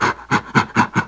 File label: GORILLA; animal; animal fun